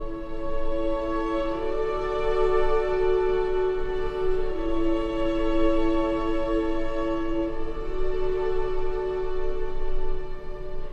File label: for cello and strings; Archi di Santa Cecilia